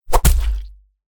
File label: horror axe chop impact person fleshy wound guts; zapsplat